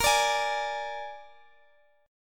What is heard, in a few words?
A sharp 7th A sharp, Guitar, DADGAD; x,x,x,15,17,18